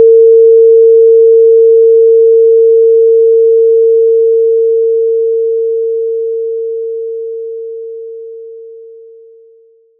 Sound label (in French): Diapason Philarmonique; fizziq